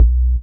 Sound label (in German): Deep Layer, baesse